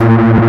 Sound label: Difference Bass Hi; M8